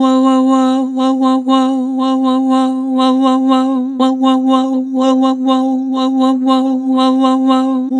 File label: Vibrato "Woah", Vocals; Harmor Vocals